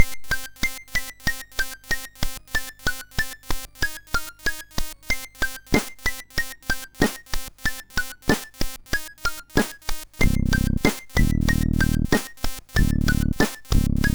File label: Rap